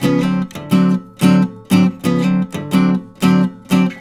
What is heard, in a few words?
RIFFS, Blues Guitar; ILIO; Fretworks